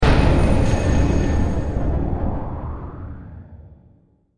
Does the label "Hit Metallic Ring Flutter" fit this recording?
Yes